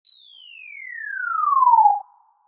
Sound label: Falling Cartoon